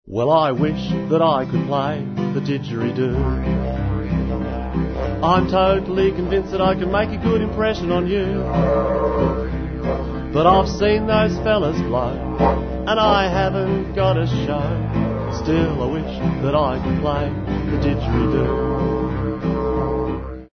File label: didge